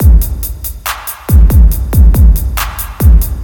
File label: slow rap drums beat call tone ringtone